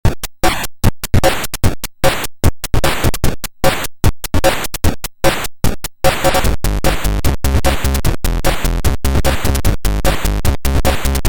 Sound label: MUSIC KIT; PAUL SLOCUM